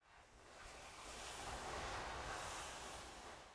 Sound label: Wave Wind